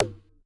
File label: Any Key; Game rip; MiSide